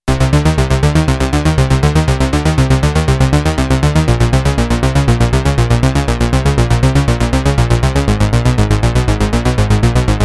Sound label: Blade Bass; sequential